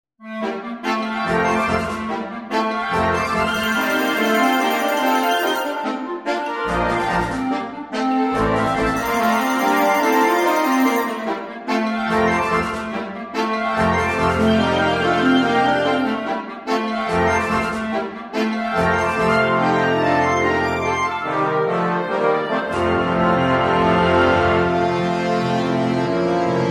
Walking With The Band - Harmonie - Fanfare

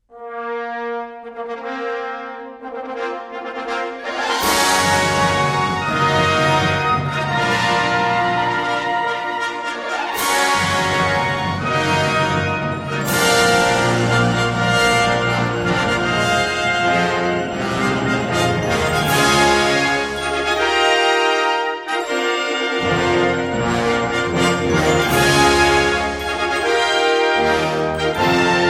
Festive Fanfare

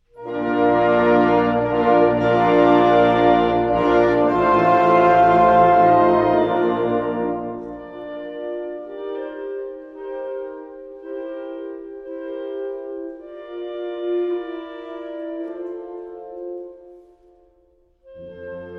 Peter Schmoll - Overture, Wind Band

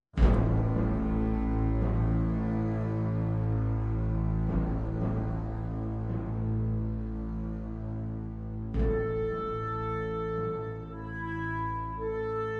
Ensemble wood winds and percussion

